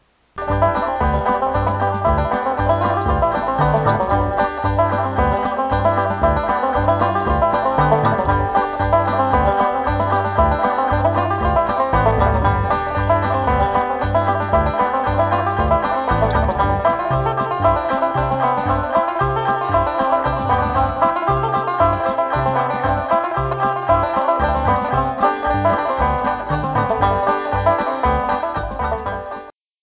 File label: fire on mtn, 5-string banjo